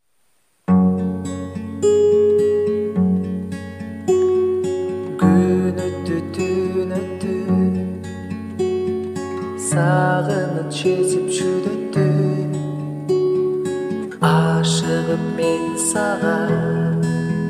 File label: gitara